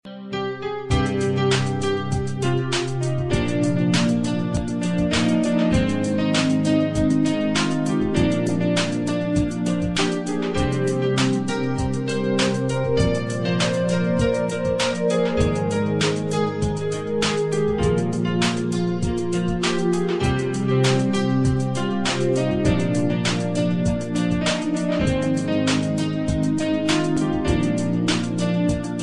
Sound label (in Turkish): Piyano